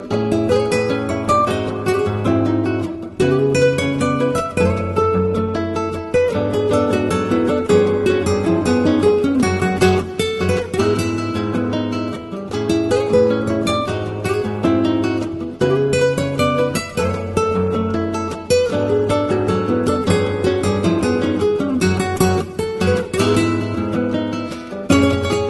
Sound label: Brisa Flamenca